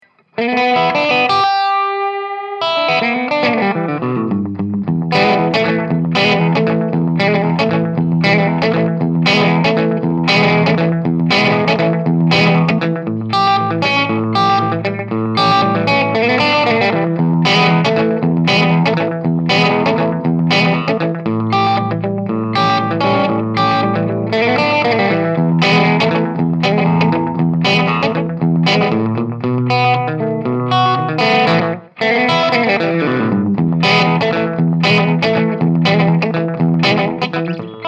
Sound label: ES335